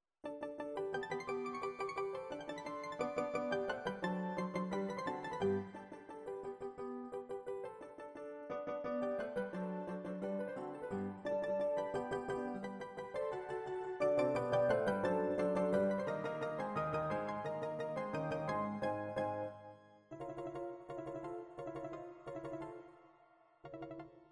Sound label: Piano Patchwork; Haydns